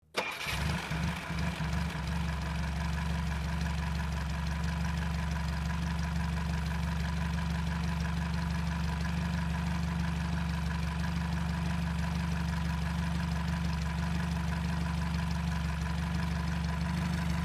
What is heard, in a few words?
Army Jeep Start Revs